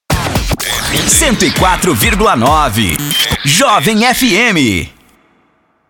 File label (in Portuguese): passagem; Jovem FM